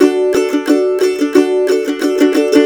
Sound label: COUNTRY